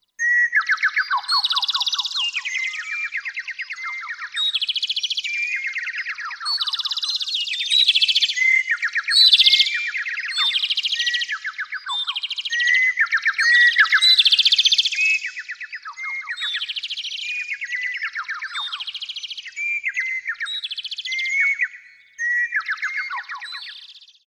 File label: sounds of spring